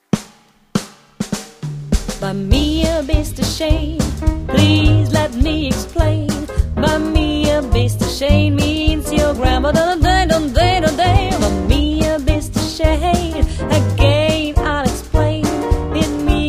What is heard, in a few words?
Pop Jazz